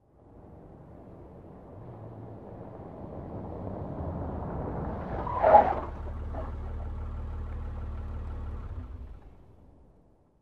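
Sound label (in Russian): резкое торможение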